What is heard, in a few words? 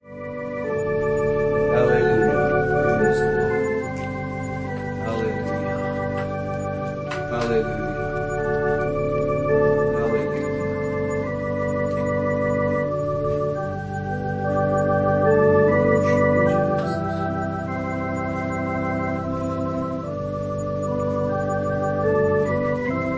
Organ prelude